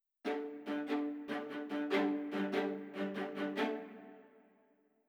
violas; Netease; kill boss tortoise

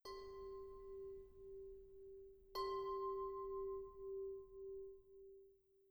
metals; Netease

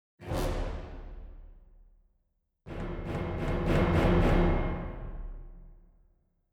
orchestra hits; Netease; gank

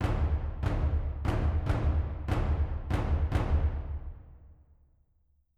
taikos; Netease; kill boss phoenix

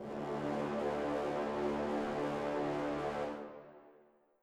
trombones; Netease; kill boss phoenix

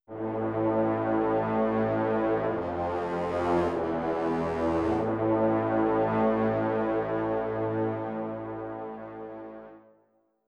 trombones; combat results lose; Netease

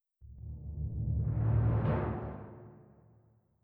timpani rolls; Netease; kill boss phoenix

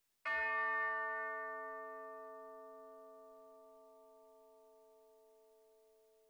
chimes; Netease

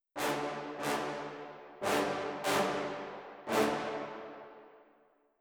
trombones; Netease; kill boss tortoise

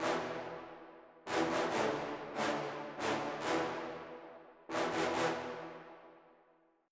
trombones; Netease; morale full

